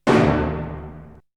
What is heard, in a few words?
Hit; ZERO G - Datafile DISC 1